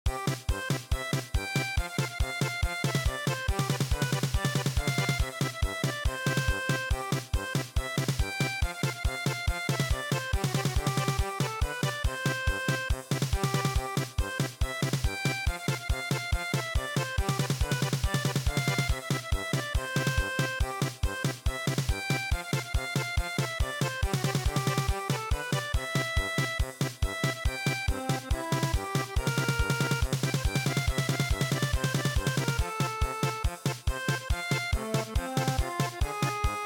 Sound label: German Polka